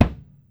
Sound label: SURDO, Brazil Drum Perc; E-MU Producer Series Vol. 7 – Old World Instruments; Drums&Percussion